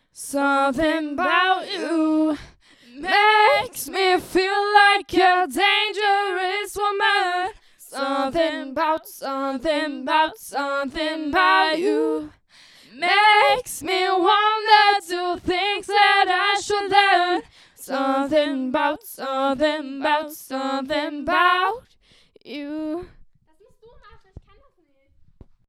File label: acapella